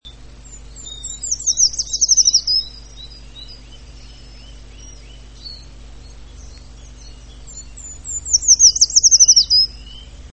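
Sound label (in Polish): pełzacz leśny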